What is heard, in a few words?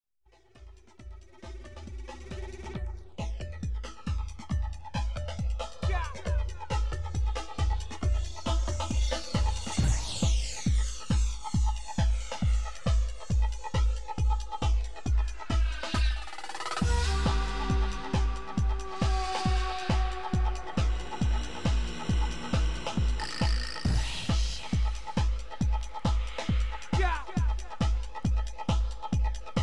needle rekkid jungle